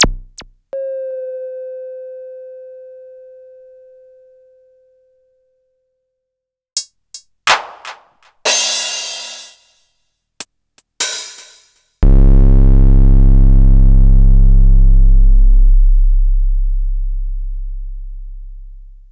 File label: Korg Electribe ER-1 Kit